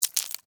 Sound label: Termite, Bite, Wood, Splinter, Crack, Insect, Bug; Blastwave; Animals:Insects